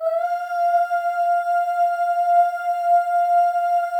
OOSC, FEM OOS, Voices

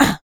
HIT, Jazz FX FEM PERC

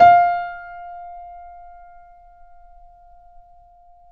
Mf F 2 off, Grand Piano off mic; AKAI S6000 CD-ROM - Volume 2

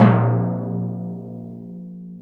TIMPANI MF; Keith Emerson Signature Series

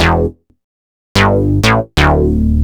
Boogie Bass, Synth Bass Loops; Sonic Foundry (Sony Creative Software); Groove Elements

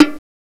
BONG LO, FAIRLIGHT CMI IIX; PERCUSN2

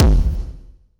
Kick, Yamaha PSR E305

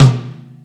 Solid Tom, Tom One-Shots; KJ Sawka - Drum n Bass & Beyond; Drum Tool Box

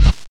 Kick, Kickdrum; Equipped Music; Loungin House

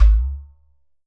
GHATAM

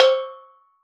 Steel Drums Layer C5, Calypso Steel Drum; Percussion

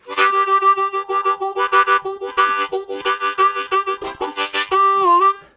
One Note, Harmonica, WAV Riffs; Zero G

